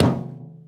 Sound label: footstep armor; Footsteps, Armor